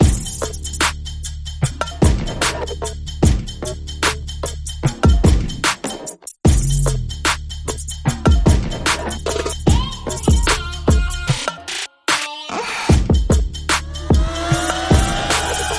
No Lead Synth Flute Strings; 30sec

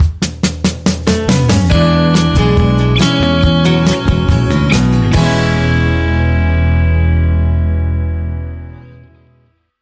Major 7th

